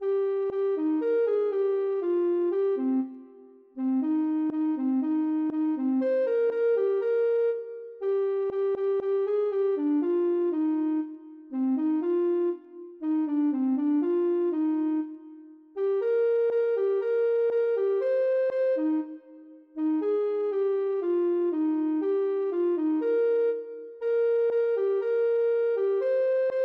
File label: delet, ocarina